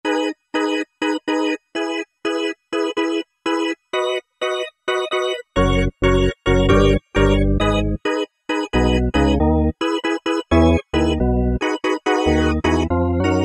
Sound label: Light Sound, Generalmusic S2R